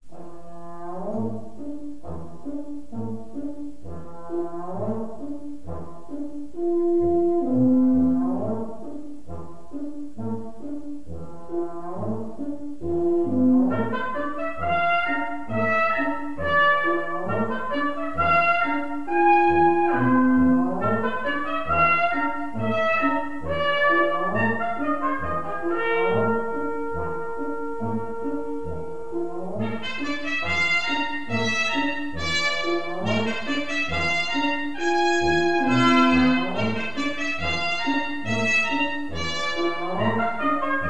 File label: bubbles brass quintet